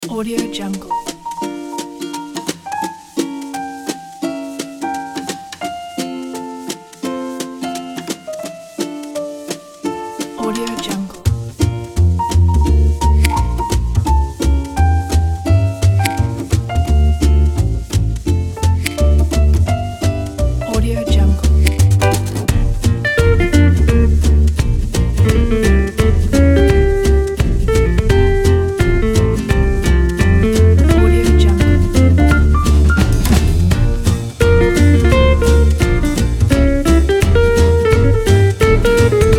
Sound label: in swing